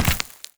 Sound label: warrior walk stone